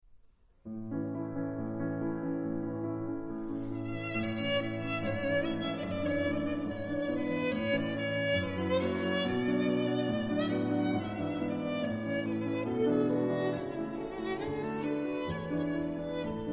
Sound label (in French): Romance violon piano